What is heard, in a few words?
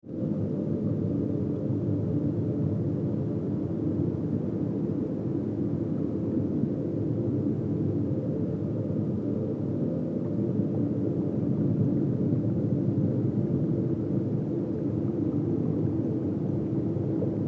FM Drone